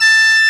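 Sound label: sheng G#3; AKAI S6000 CD-ROM - Volume 1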